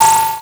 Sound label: Multimedia Internet CD-Rom Flash Buttons Short